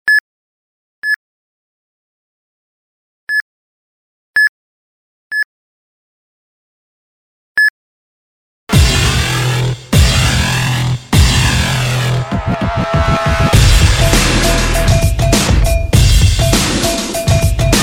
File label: audio beep double